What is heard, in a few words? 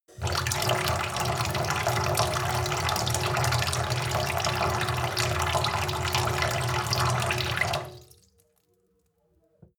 sink fill with water soft drip; industrie-wirtschaft; gastronomie